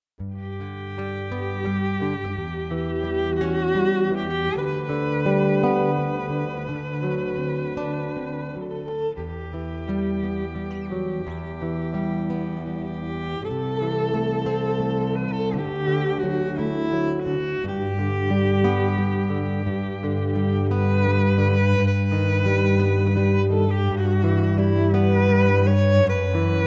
Ave Maria, Schubert - Trio of Gui, guitar violin cello